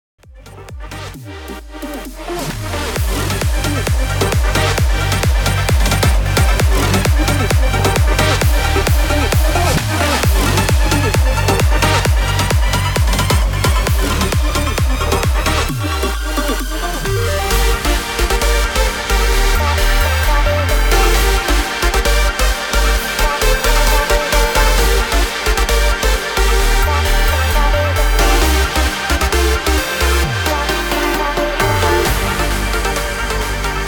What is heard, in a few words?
132 BPM